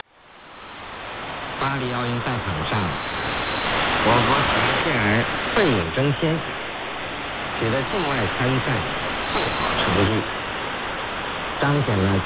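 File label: Deutscher Wetterdienst RTTY, F1B AM; 7646,0; DDH7; Pinneberg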